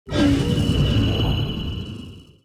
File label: sorcery